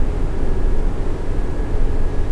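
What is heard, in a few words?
street interior, ambience; bespin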